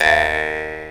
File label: Jaw Harp Eh; Planet Earth; Digital Sound Factory Planet Earth